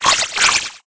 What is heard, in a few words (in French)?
Cri; rongourmand